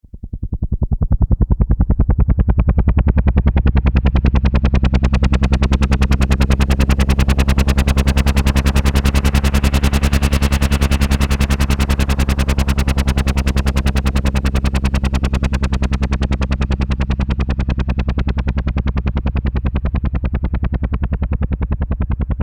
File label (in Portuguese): helicoptero passando